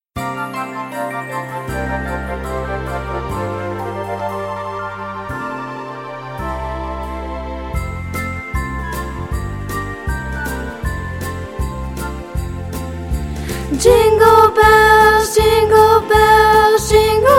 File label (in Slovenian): Pesem Jingle bells